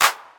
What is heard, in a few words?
smooth clap one shot d sharp key, Royality free clap sound tuned to the D# note; single hits one shots